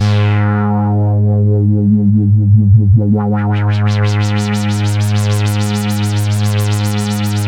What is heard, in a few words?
attack G#1; Best Service Alpha Dance vol.2